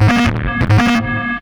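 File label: Sequencer, Hard & Loud Techno; USB Soundscan vol.01; AKAI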